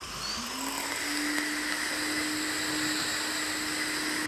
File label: Slime Inhale Loop